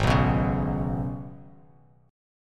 G sharp Minor Double Flat 5th G sharp, Bass Standard; G#mbb5; strummed; JGuitar